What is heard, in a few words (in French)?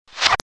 TCHIT; MOBICLIC